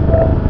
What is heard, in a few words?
robot; games